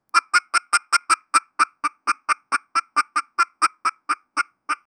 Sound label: duckling soft quack; animal sounds